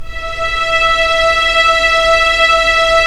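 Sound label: STR String Section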